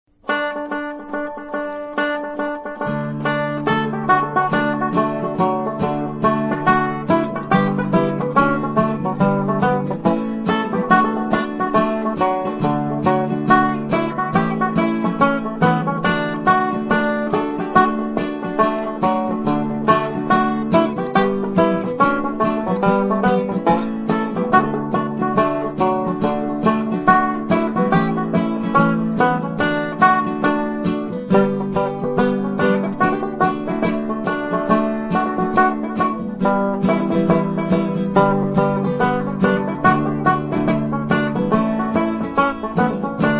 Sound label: belfast hornpipe